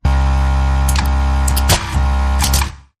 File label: Battery Hum